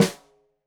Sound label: Snare Stereo, Drums; Deeply Sampled Drums; SMDrum